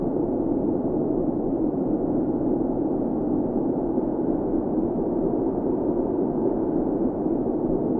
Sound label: Ambiance; Knytt